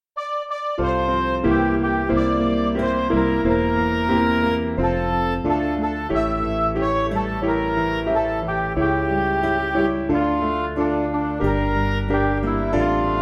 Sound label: Teach Me Lord, Russian, Midi